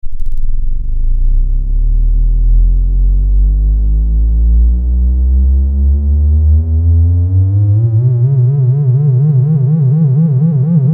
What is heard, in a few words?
front drum teremin